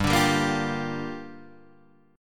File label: G Minor 7th, Guitar, Half Step Down